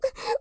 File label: Sob; Beth